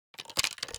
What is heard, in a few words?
breech load, weapons m1014; Urban-Coalition; Decay; arccw ud